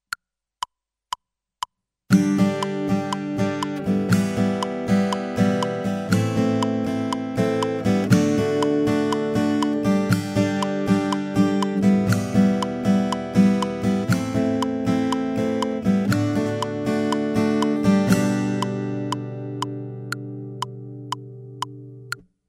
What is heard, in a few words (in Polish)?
cwiczenie bicie